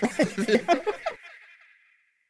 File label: tower attack; propack